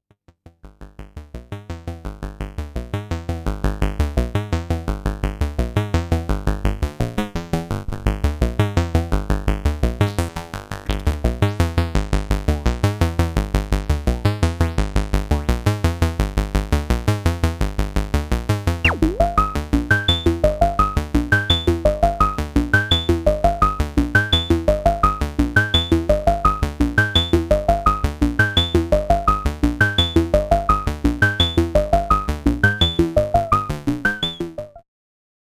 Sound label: Moog Modular